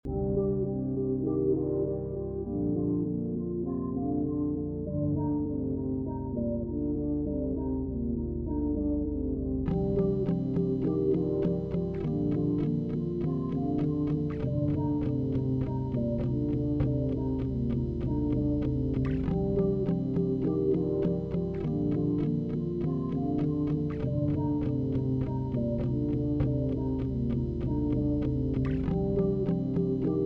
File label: fully melody 100bpm